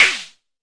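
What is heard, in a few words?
mbrute snare, Arturia MiniBrute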